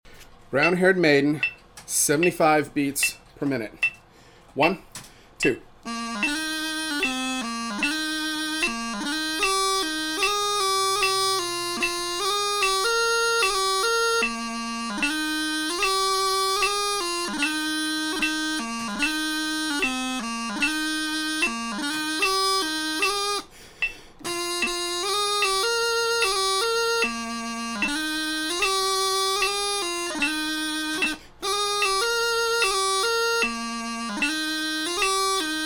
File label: 75bpm, bagpiper; Brown Haired Maiden